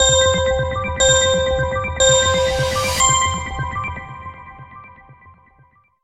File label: countdown